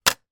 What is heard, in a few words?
household audio cassette tape put down on table